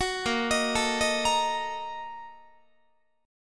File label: Bb-Major 7th Suspended 4th Sharp 5th, Guitar; arpeggiated